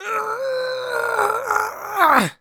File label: Human Male Groan Constipation